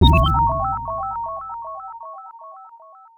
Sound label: Miss; Fantasy Zone II DX; System 16C; arcade